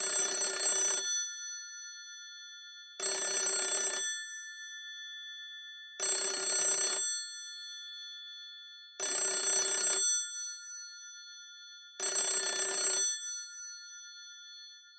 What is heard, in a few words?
Rotary Phone Ringtone, Loop